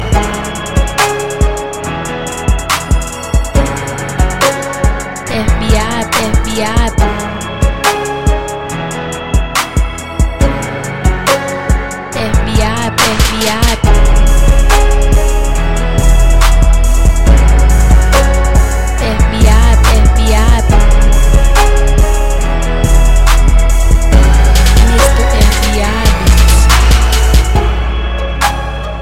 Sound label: Rnb Beat